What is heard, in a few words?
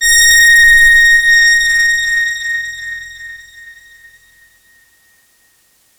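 big brass A#5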